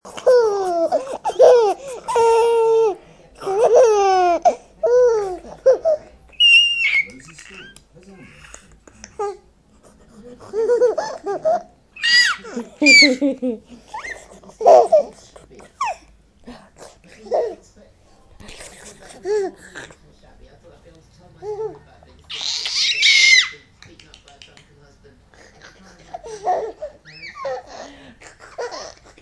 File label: giggling pixie